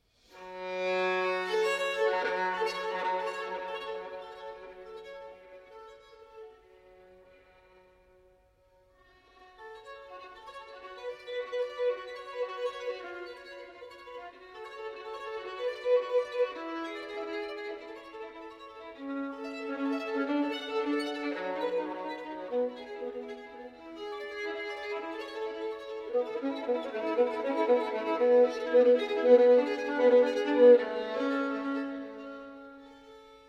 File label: Partita for Solo Violin No. 5 in G Minor I. Prelude